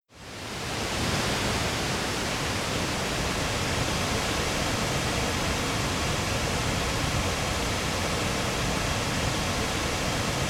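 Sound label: waterfall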